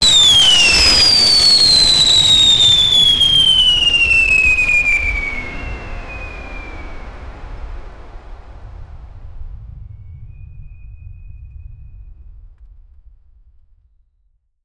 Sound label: realistic sound of a bomb